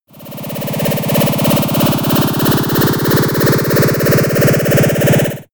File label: sci-fi approaching ufo